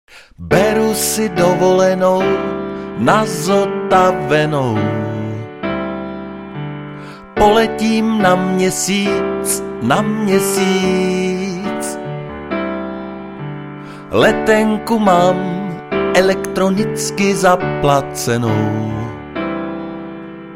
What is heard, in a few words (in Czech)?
klavir